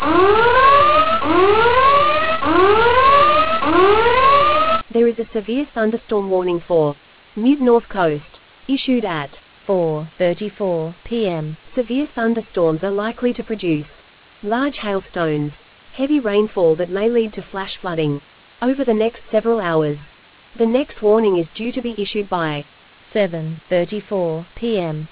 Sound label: storm audio